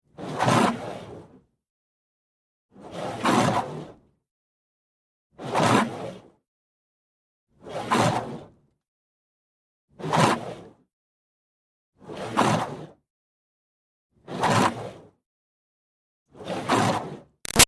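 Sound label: Water Spray Interior Car By Hard Med; Car Wash; Spraying; Big Room Sound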